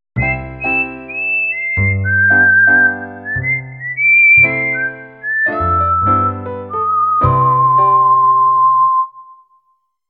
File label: intro whistle